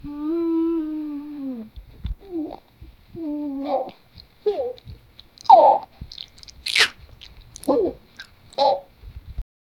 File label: female satisfied chewing, eating